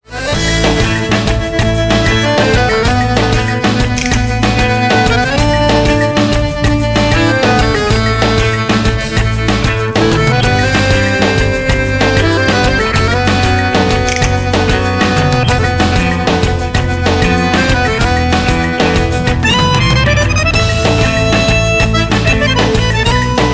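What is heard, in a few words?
accordion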